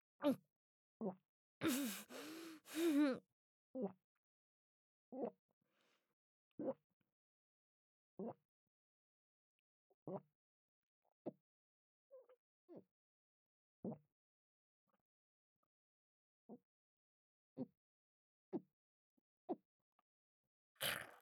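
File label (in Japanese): ザーメン ゲップ（5連発!!!!!）; ザーメンゲップ集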